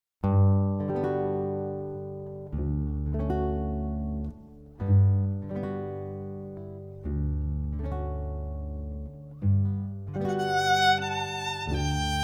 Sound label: Trio of Guitar, Violin; guitar violin cello; Gymnopedie